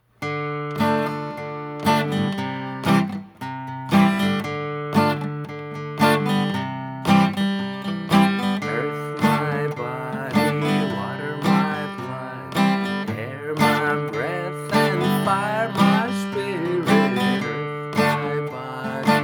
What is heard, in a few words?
Rhythm Guitar; reggae version